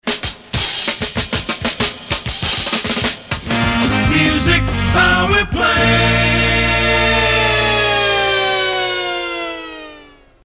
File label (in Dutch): instrumentaal; SPSP 2